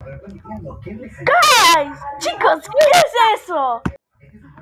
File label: wowowoowwowowow